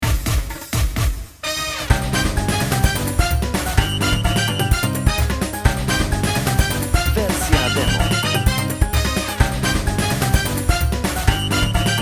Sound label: Latino dance